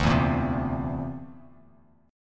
D 7th Suspended 2nd Sharp 5th D, Bass Standard; D7sus2#5 strummed; JGuitar

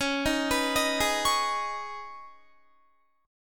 Db 7th Suspended 2nd Db, down, Guitar; Db7sus2 arpeggiated; tuning=4E,4A,5D,5G,5C,6F

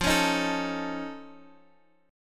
F Augmented 7th F Guitar Half Step Down, chord

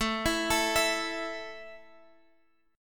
A 5th A, guitar chord; A5 Chord {X 12 14 14 X 12} arpeggiated

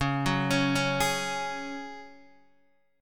C sharp Suspended 4th C sharp down Guitar DADGAD

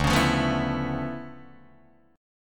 D Minor Major 13th, down, Guitar, DADGAD

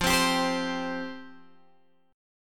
F Suspended 2nd F Guitar Open G

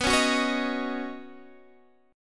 Eb Minor 7th Sharp 5th B

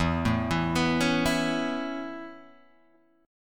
A Major Add 9th E Guitar Standard, chord; Aadd9/E arpeggiated; JGuitar

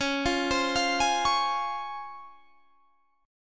C sharp Major 7th

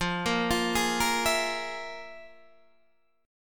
F Minor Major 7th Double Flat 5th, Guitar, DADGAD

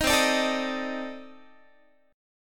C Minor 6th Add 9th C Guitar; Cm6add9 strummed; 18,20,17,19,19,x

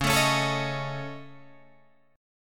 Db Suspended 2nd Sharp 5th, Guitar, Drop D

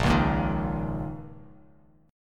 A sharp Minor Double Flat 5th, Bass Standard; strummed; 6,4,8,8; JGuitar

